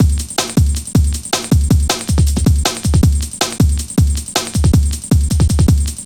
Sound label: Drum Loops, 158.5; Jungle